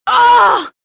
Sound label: pain